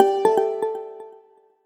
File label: system notifications; Amazon Fire Phone